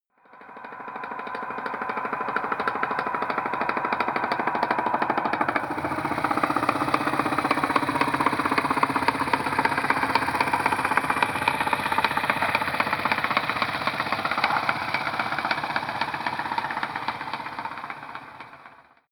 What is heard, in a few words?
harbour